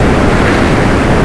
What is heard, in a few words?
turn loop, surface; WhiteOut